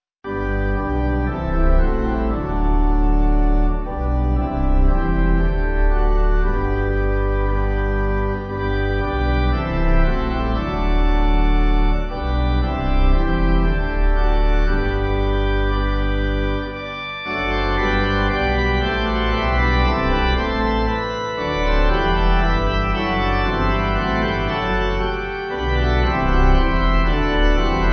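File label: Pipe, Pipe Organ backing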